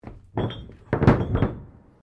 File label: CHAIN LARGE MOVE, Sounds onboard HMAS Castlemaine; Boats (Sound Effects:Vehicles)